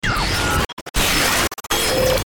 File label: Power Up Stutter Bursts; Rise And Dive Sound Effects; Production Element Imaging Element Accent Transition